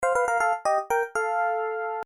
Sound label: levelup; dragon quest